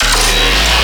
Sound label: Bass Hit D#, Dubstep; Twilight Bass; Ghosthack; AC2024 - Day 13 - Dubstep Sounds 2025